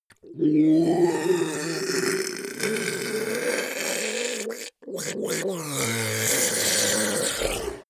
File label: ice golem melt groan; koerpergeraeusche; comedy-cartoon; soundpool